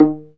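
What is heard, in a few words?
viola pizzicato, Solo; Vla Pizz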